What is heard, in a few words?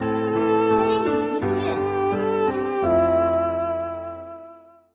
Lose